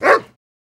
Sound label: Dog Bark Yelp, golden setter